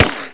ric, bullets

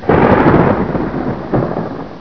thunder

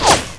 whizz, bullets

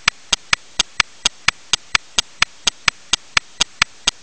tnt timer

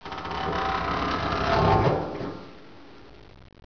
wood creak

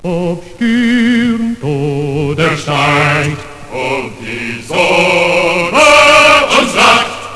panzerlied short

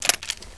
webley reload insert

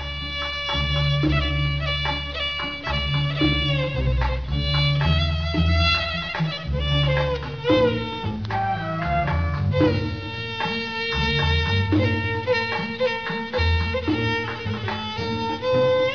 music

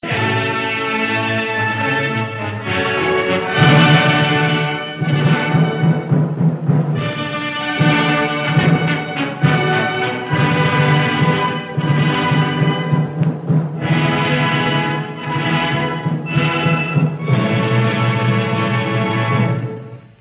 German Military Victory Fanfare

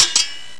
flag

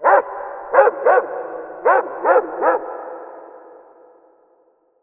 my dogs

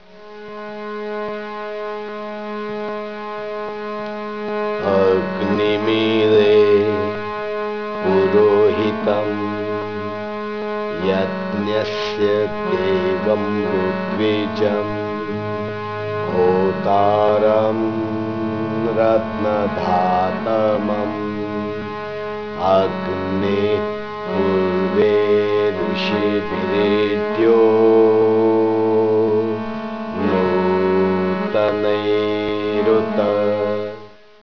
song

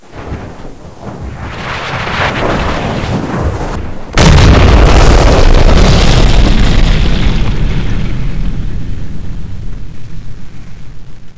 impact; bunkerhill

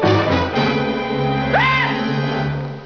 ahhh

